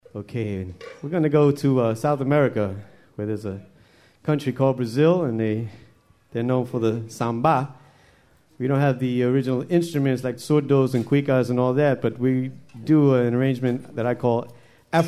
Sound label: Afro-Samba; folklife